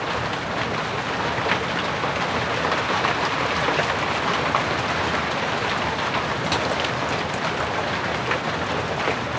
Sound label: rain inside